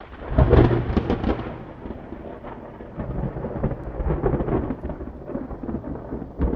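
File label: Thunder Crack Rumble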